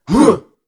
Men Huah Hugh Strong

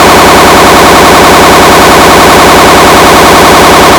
Noise

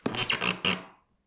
Wood Creak Single

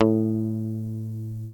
Muted Guitar A

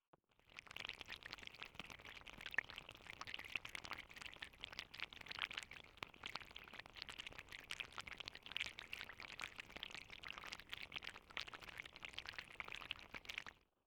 Underwater

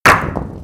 Bricks

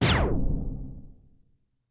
Tape Slow

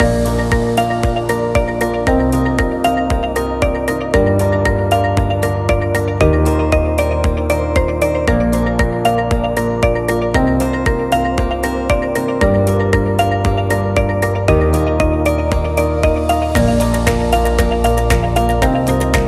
Motivational Corporate Loop

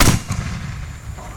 Fireworks Mortar

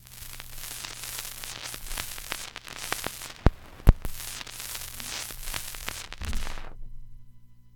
Degrade Vinyl Stall

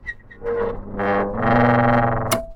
Mechanical

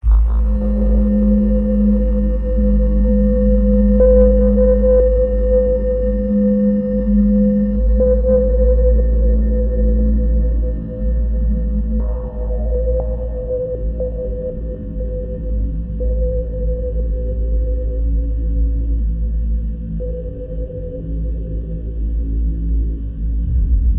Bass High Pitched Beeps

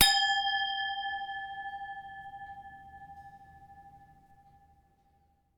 Wine Glass White Wine Empty Gentle

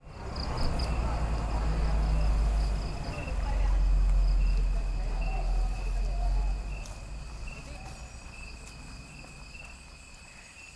Wild Animals

